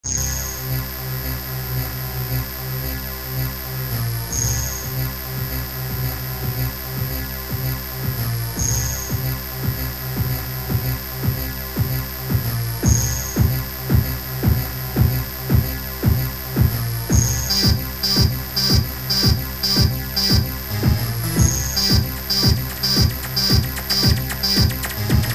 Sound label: lofi goa